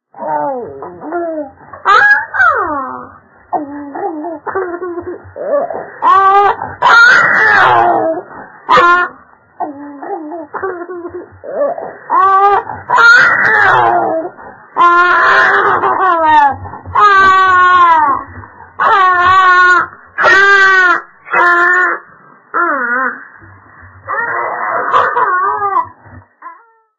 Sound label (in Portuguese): Vinhetas Bebe